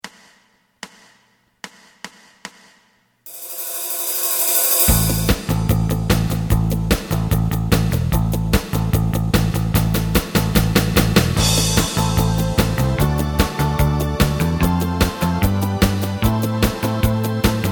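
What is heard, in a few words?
lead guitar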